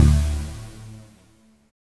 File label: NOISY A1, dubstep; Jack Sparrow; Deep Dubstep Progressions